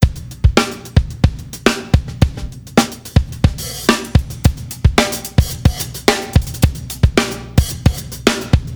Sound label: ac drumloop room to front